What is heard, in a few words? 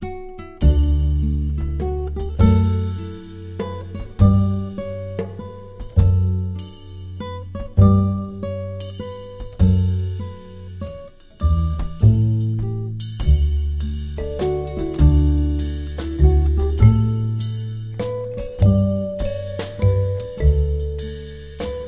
The First Noel Jazz; Trad (PD)